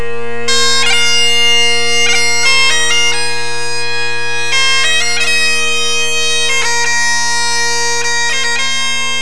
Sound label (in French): cornemuse plinn